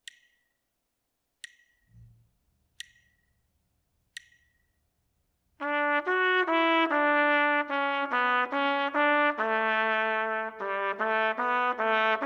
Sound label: melodic dictation; ear training